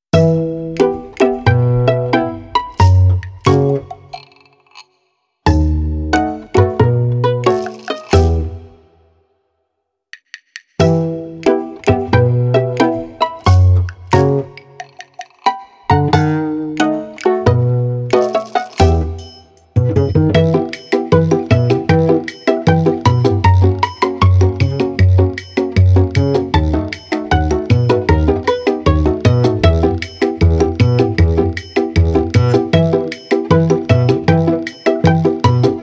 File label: Quirky Pizzicati